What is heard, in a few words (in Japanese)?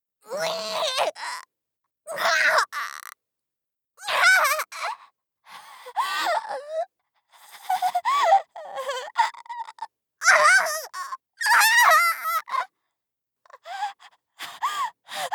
正の字を体に刻む; 効果音なし